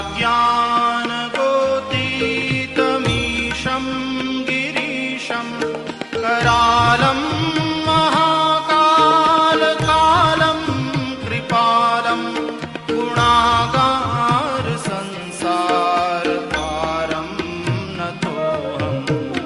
female version

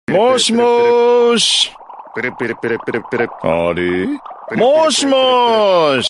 laugh; one piece; perona

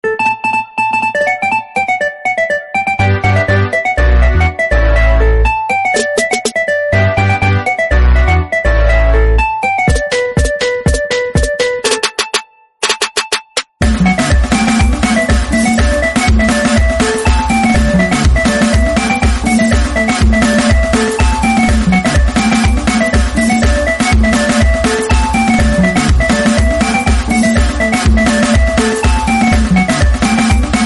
instrumental bgm

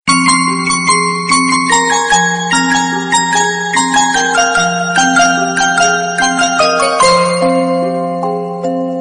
lovely bells

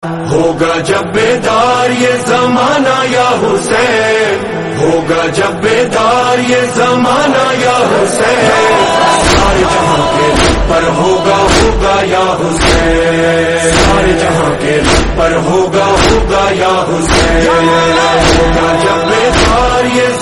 noha islamic

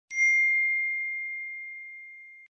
iphone 13 ding notification

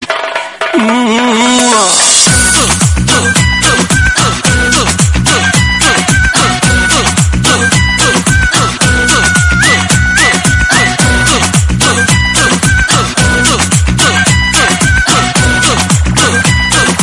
tone

